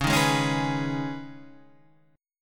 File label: C sharp 7th Suspended 2nd, guitar chord